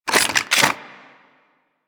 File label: SMG Reload, weapons; Protea